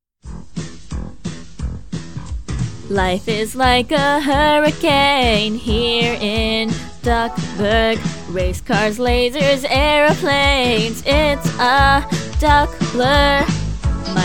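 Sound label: [F] [WOO-OO!][Cover], female singers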